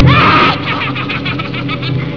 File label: Witch Laugh